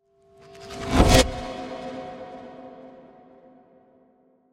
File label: transition